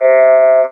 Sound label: talk box eh